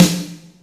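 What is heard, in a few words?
snare, Drums & Perc; AKAI S6000 CD-ROM - Ultimate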